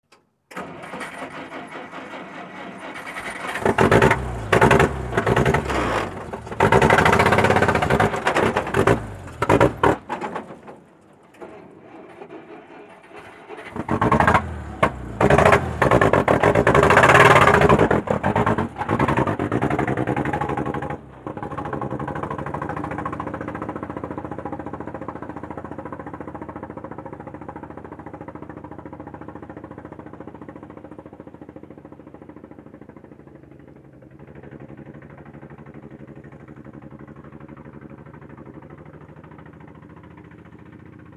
Dodge Fire Trk Rough S, fire truck

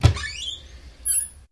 Door Cupboard Open

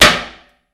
Tub Hammer Hit; hammers

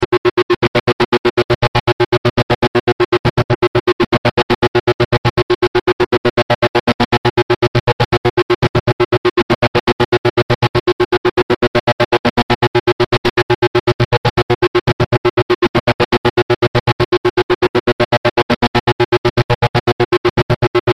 Electrical Distortion; Various Intensities.

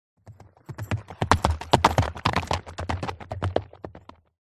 By Single Horse Cante

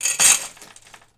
Chain Dropped Across, chains

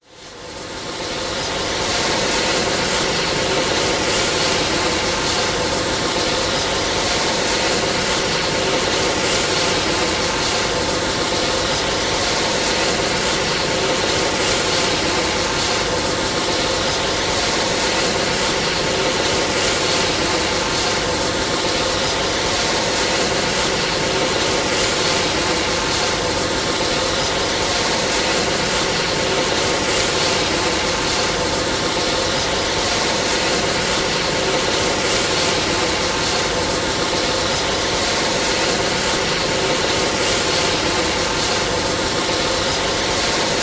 Apache Heli Exterio, ah-64 apache ext steady

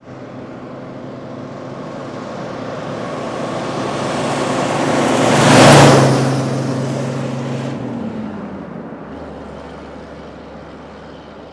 Jeep By 30 Mph, jeep cherokee 84

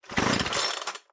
chainsaw pull

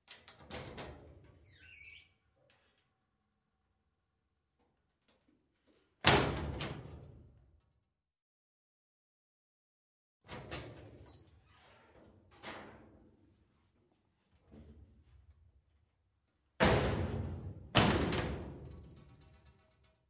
Locker Slam Mediu

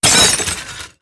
Glass Breaks Medium; windows & mirrors

